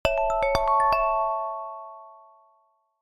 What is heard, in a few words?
success